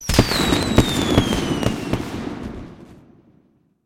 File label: Firework; In Clear Result Firework